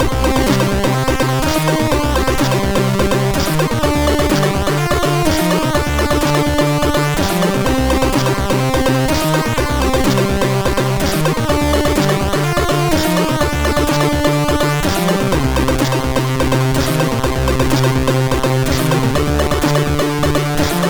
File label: intro, MOS6581R3; Attitude 15